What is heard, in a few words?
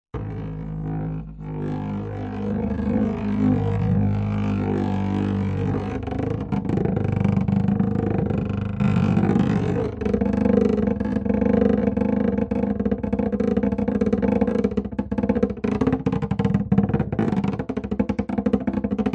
foley wood hollow vibrate rattle object; zapsplat